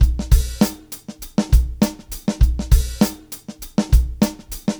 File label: Live Beats, R&B Drums